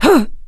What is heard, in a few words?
female attack grunt, witch, voice, mad; npc; Left 4 Dead 2